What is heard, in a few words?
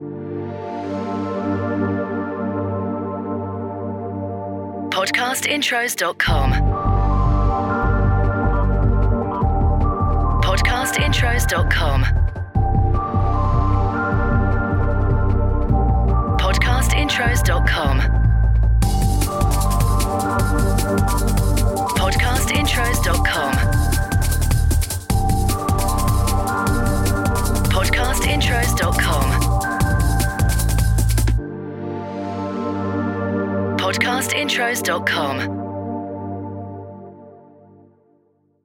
DRUM N BASS Night City; Podcast Watermark